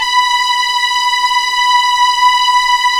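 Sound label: B5 legato forte, violins; East West; The Ultimate String Collection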